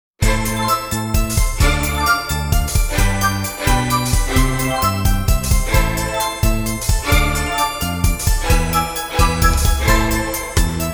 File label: brass minus